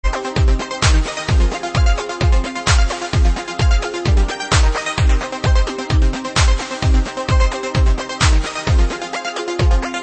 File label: Tech rance